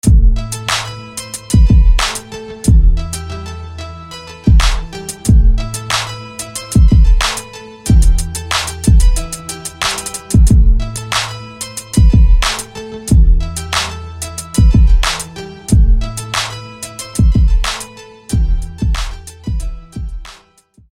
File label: Techno Harp; SFB FX